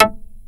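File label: TURBO ACID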